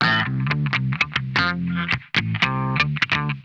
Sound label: DIRTY OCT, Funk Guitar; Zero G - Funk Guitar